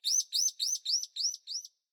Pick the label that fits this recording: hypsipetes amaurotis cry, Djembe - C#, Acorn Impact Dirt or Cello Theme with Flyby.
hypsipetes amaurotis cry